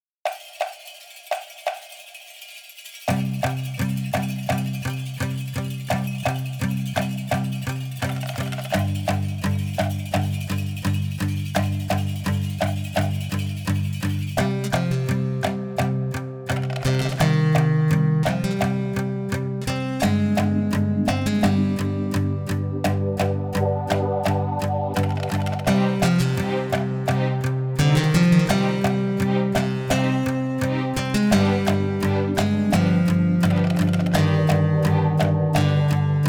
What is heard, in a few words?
guitar sad; dune